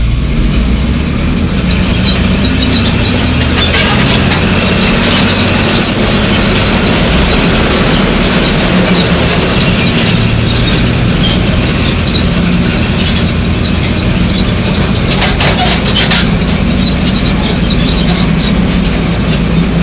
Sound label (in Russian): edet tank